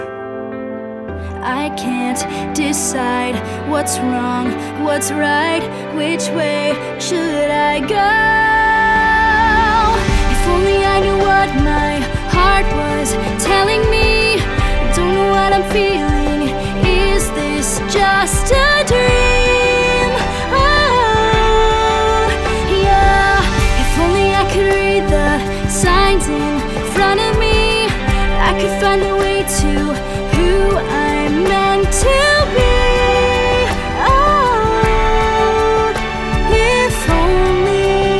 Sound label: guide vocal